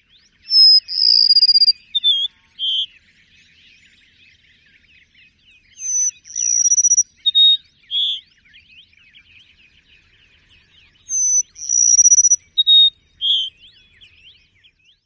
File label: song; TX